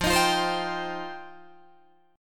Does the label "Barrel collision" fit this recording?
No